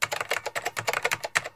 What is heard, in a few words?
typing